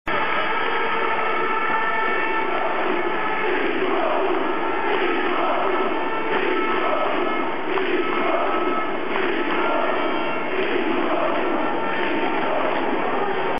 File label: Action Sounds